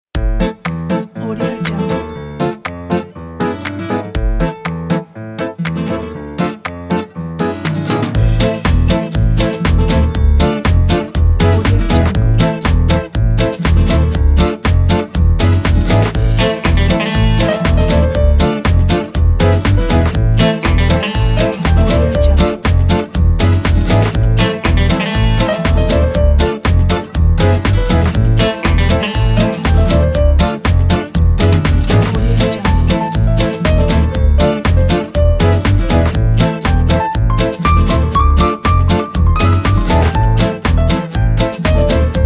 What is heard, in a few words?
electro gipsy swing